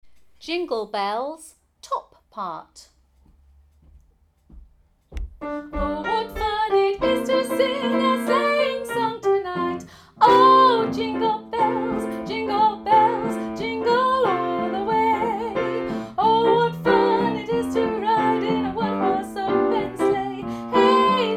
vocal harmony TOP PART; Jingle Bells

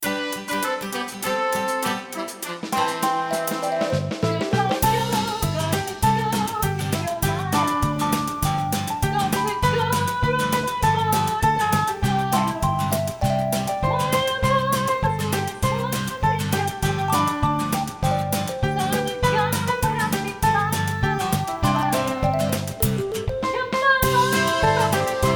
Band With Vocals

